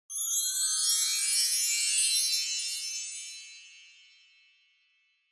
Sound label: Wind Chimes Slow Up Wide